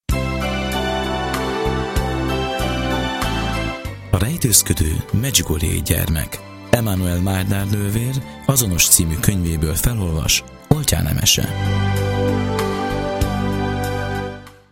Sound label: Jingle; A rejtozkodo M Gyermek Bev; A rejtőzködő medjugorjei gyermek